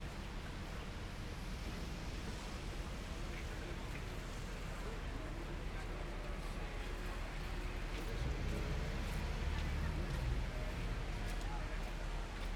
MADRID Gran Via Up Street Firetruck Pass-by Loud Sirens Slushy Traffic Pedestrians; Systematic-Sound; General Ambience Series; Sounds Of Madrid 01